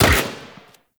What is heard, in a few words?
Rifle Fire